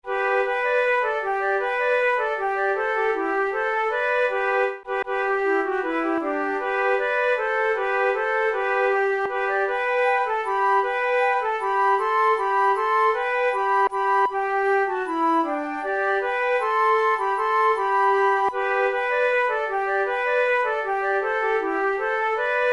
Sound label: arabys daughter duet, flute